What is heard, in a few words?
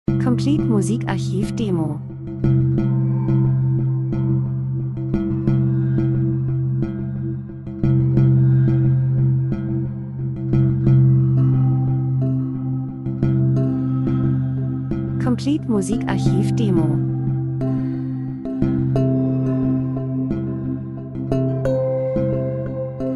drums; Elektro Rhythmus Asien Fremdartige Stimmung Getragen Traditionen Menschen Zeitgeschehen Reisefilm Dokumentation